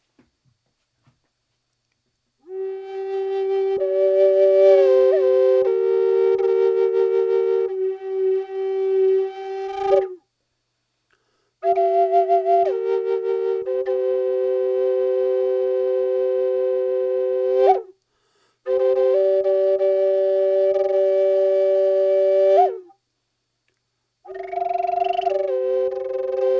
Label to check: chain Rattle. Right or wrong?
Wrong